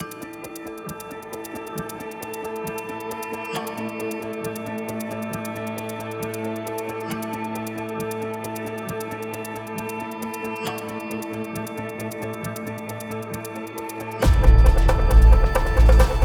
Growing Tension, Percussive Motion; Narrative Mind

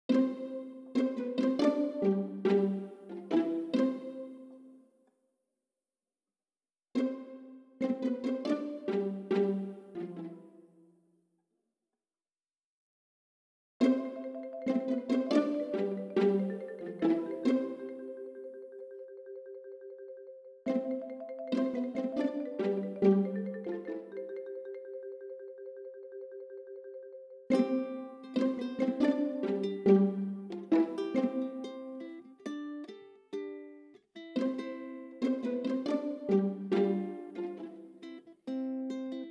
quirky underscore

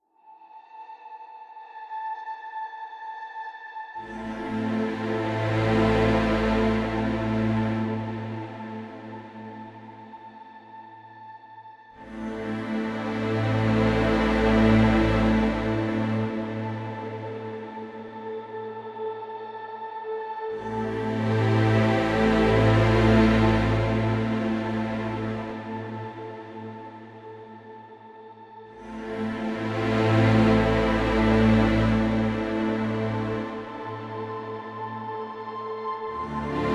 Breaking Waves Underscore, Cinematic Textures

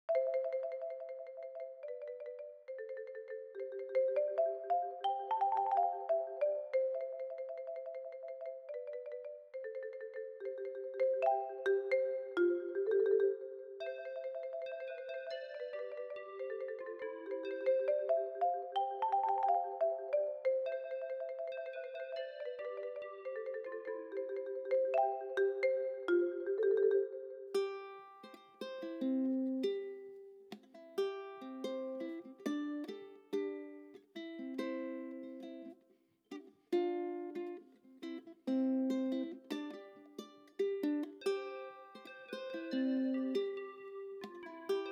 quirky underscore

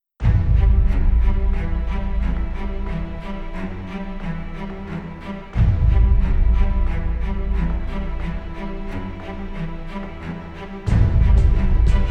Worlds Enemy Underscore, Drama; Narrative Mind

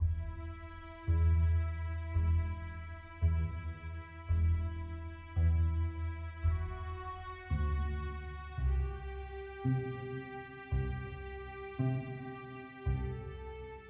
In A Heartbeat Underscore, Reflection; Narrative Mind

